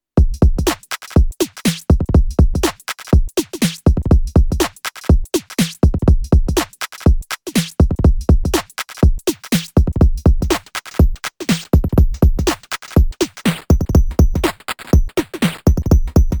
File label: Nunomo Qun Mk2 Sample Drums Bitcrusher